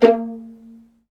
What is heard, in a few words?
violin C; ILIO; Synclavier Strings